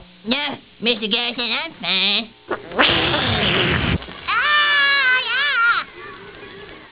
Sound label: fart pip